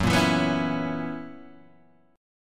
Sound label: F sharp 11th F sharp Guitar Open G